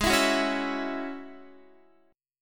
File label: Ab 7th Suspended 4th Sharp 5th Ab down Guitar